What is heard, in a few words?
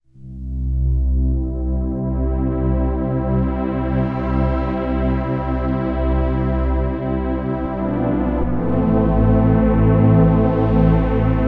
Vintage Pad, yamaha sy77; sy77 pads